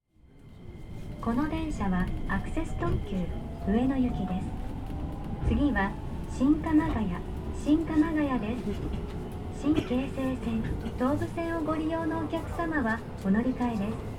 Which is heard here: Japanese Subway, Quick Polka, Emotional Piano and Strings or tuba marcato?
Japanese Subway